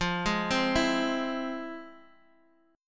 F Minor Major 7th, Guitar, Open D; x,x,3,2,3,2

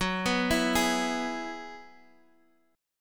F sharp 7th Suspended 2nd Suspended 4th F sharp

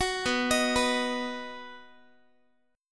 B Major, Guitar, 4A,4E,5A,5D,5G sharp,6C sharp; arpeggiated

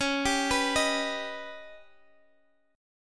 D sharp Augmented 7th C sharp, guitar

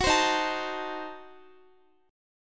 Eb Diminished 7th, Guitar; Ebdim7 strummed

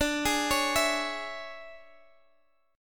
C sharp Minor D; C#m/D; arpeggiated; JGuitar

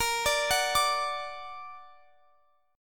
Bb Augmented Bb, Chord; arpeggiated; x,x,20,19,19,22

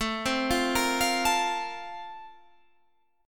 A sharp Major 7th Suspended 2nd A

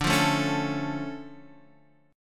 D Minor Major 7th D down Guitar Standard; DmM7 strummed; JGuitar

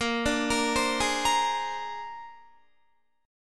A sharp 9th A sharp Guitar; arpeggiated

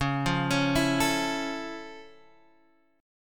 F Augmented Major 7th C sharp, guitar chord

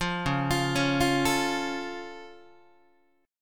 C sharp Augmented down Guitar, chord; arpeggiated; JGuitar

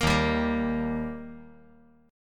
Eb Major Eb down 5G,4C,5E,5A; strummed; JGuitar

